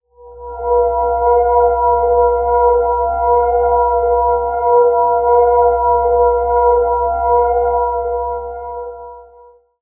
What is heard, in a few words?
light, cantrips; psfx